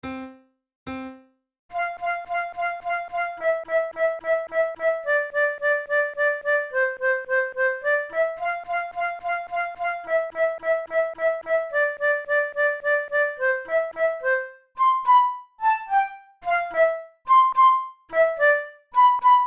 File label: waltz, flute